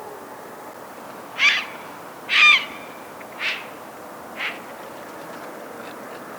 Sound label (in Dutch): blauwe reiger roep